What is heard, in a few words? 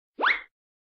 zoop